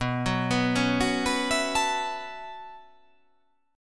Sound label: B 9th Suspended 4th B down